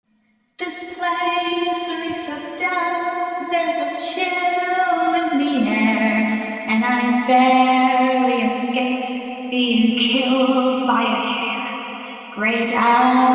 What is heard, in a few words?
breakdown song female ver; lastor-x27-s